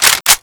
bolt pull, weapons; cw l85a2

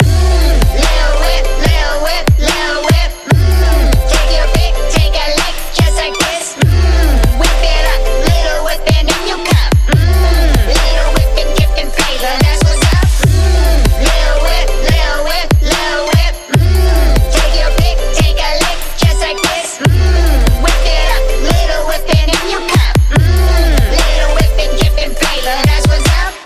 lil whip emote loop; actmod; fortnite

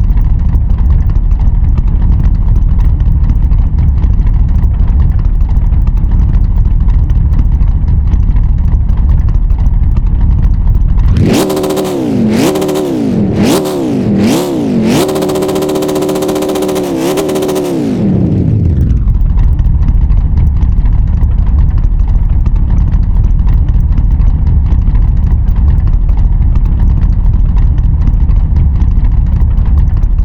idle, vehicles cars; lwcars

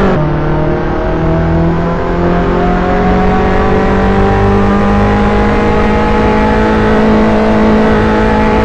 third, vehicles cars; lwcars

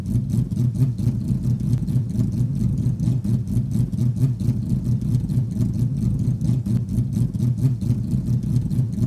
idle, vehicles cars; mazda787b; lwcars

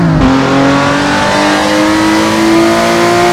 third, vehicles cars; lwcars; lotus exiges roadster

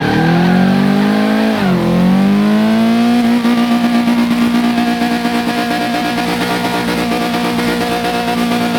rev, vehicles cars; lwcars

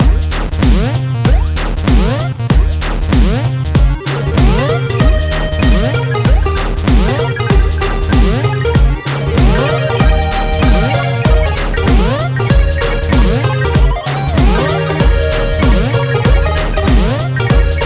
music loop; actmod; fortnite; emote; silly jump

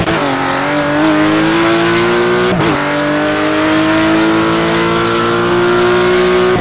third, vehicles cars; lwcars

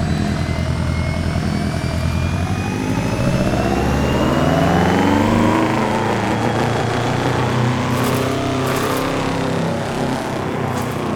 rev, vehicles cars; subaru BRZ; lwcars

